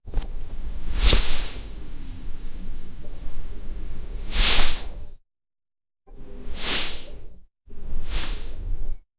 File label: warm towel squeeze